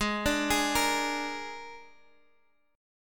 G sharp Suspended 2nd Flat 5th; x,x,x,x,8,9,10,7

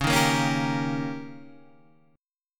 D sharp 7th C sharp, guitar

